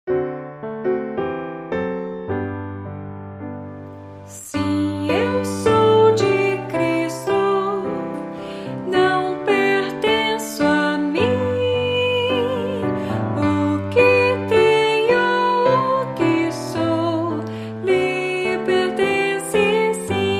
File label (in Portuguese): voz piano